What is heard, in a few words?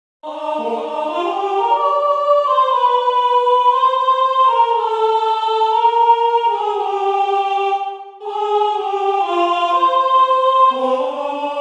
alto